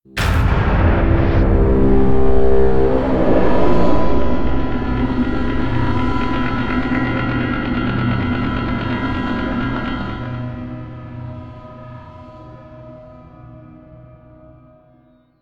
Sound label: Mission Music Creep Stinger; starcraft 2 wings of liberty